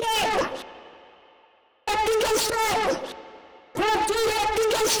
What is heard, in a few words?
Vocal Sample; modern hip-hop